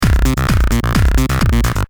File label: bass mix; SYLENTH1